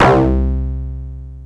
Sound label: RUFF BASS C3, Yamaha TX-81z